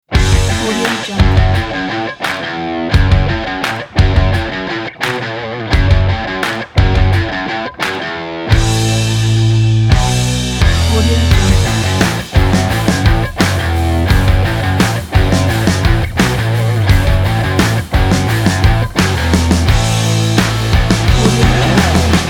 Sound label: high energy driving rock